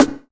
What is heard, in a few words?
Snare, trap drum kit; MZ BEATS - FREE TRAP DRUM KIT